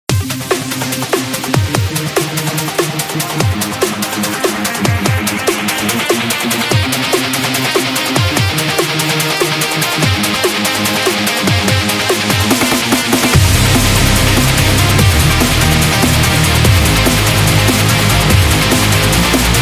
Fast

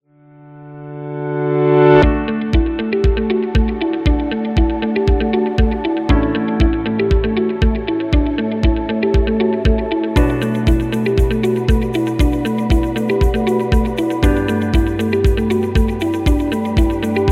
Business Background Music

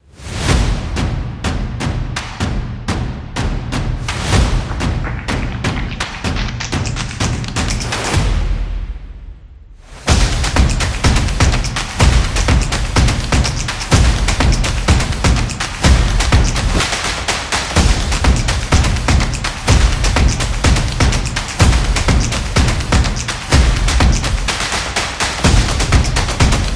Festive

